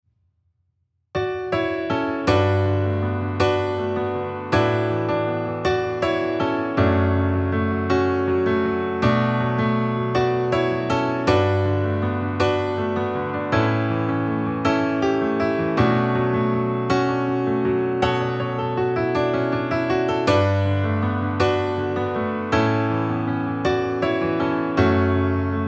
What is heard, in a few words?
80bpm bm dreamy; Suno